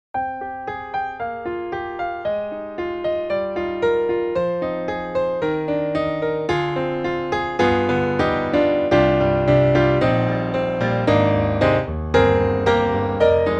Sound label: GRAND PIANO Steinway; BEST SERVICE; GALAXY II GRAND PIANO